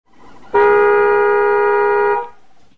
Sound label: klaxon, car horn; chaser